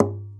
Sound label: KNOCK, Nylon Guitar